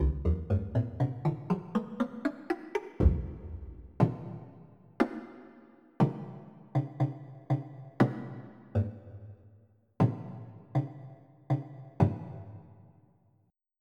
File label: Woodblock; FF7